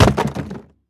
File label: Crash Objects Plastic; gamerip; Car Simulator 2; android